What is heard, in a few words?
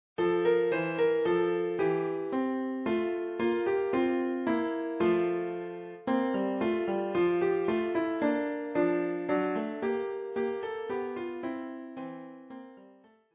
We wish you a Merry Christmas Klav, Klavier; englisches Weihnachtslied für Klavier solo, mit Vorspiel